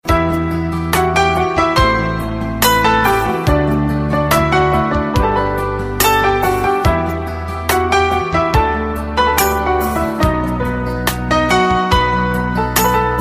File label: Best Instrumental Song Ringtone